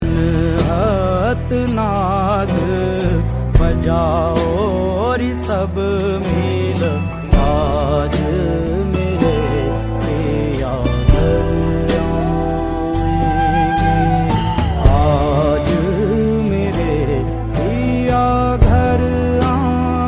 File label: slowed reverb